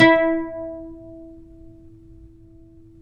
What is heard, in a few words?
OUD E; Guitars; Spectrasonics; Hans Zimmer Guitars VOL-2